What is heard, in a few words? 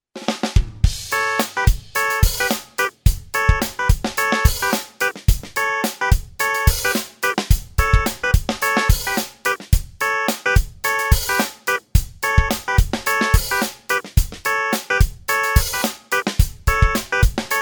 Clavi